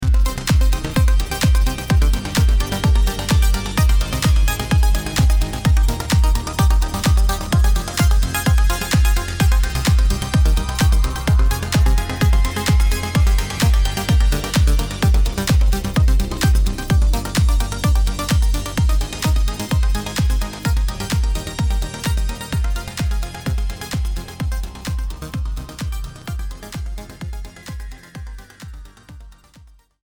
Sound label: Synth Pop Mix WET